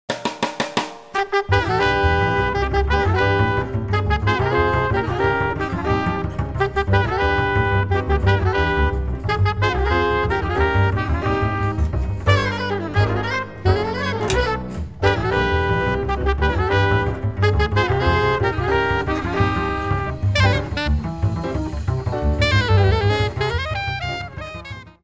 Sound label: minus Drums